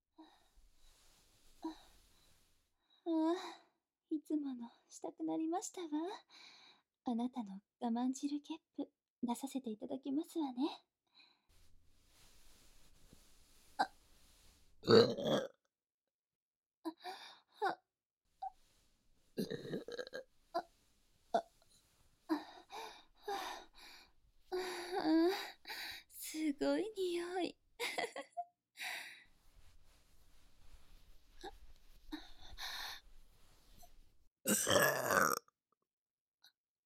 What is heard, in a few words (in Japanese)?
精液ゲップ, 効果音入り